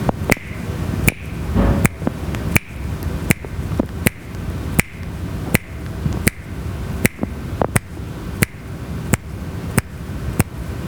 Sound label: snapping